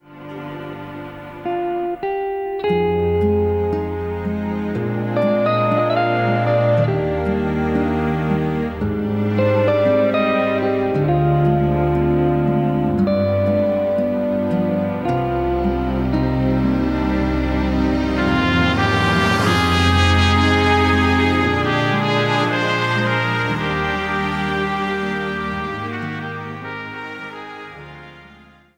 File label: Electric Guitar